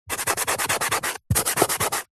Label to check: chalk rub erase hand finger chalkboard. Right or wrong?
Right